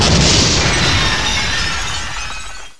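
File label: glass die; duke nukem total meltdown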